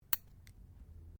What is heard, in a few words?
NAIL CLIPPERS CLIPPING TOENAILS; Miscellaneous Human Sound Effects; Miscellaneous Human (Sound Effects:Human)